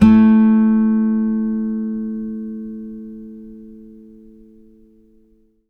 5ths A3, Blues Guitar; ILIO; Fretworks

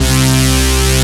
REZILLA C2; E-MU Formula 4000 Series Vol. 5; Protozoa; Vintage Presets

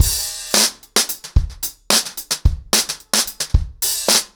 110BPM, ACOUSTIC DRUMS; The Roots Drumloops

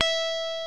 LES PAUL

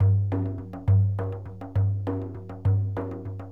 Sm Frame, World Percussion

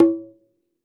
Conga Hit, Latin Percussion, One Shots

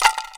RATTLE, INDIAN PERC

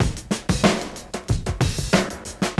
DRM CHOP 93; AMG Gota Yashiki Groove Activator

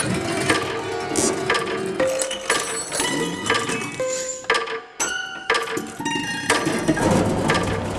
Prepared Piano Loop; Sonic Foundry; Sony Creative Software; The Electronic Music Manuscript Collection

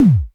KLONE, KICK, Klone Dual-Percussion-Synthesiser; KLONE KICK NW8; 300 Drum Machines

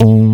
BASS, SYN BS; AKAI S6000 CD-ROM - Volume 1; SAMPLE WAVE MIXING 1

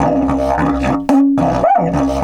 108, Didgeridoo

